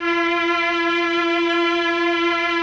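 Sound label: Mellotron strings F3; Greytsounds VOL-1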